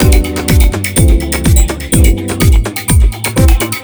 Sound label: house; Google; NewWave Labs